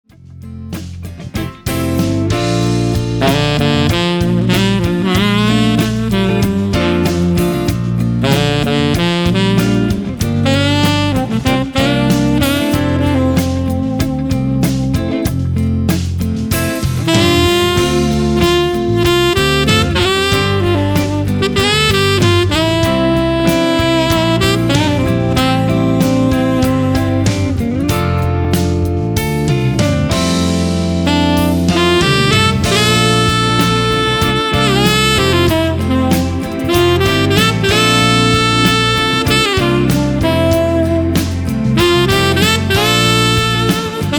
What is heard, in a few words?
Smooth Jazz, sax